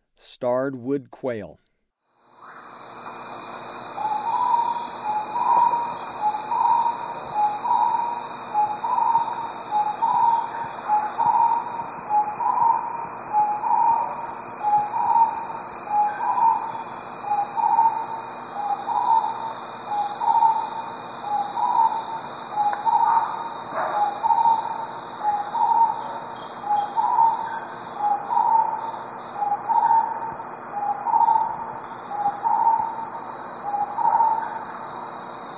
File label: Starred Wood-Quail; S. America; Eastern Lowlands